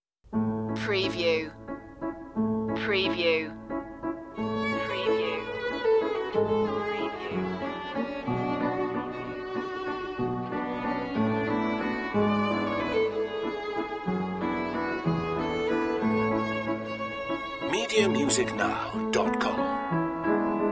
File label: old waltz